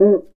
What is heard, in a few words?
CUICA, World Percussion; Synclavier Percussion; World & Orchestral; ILIO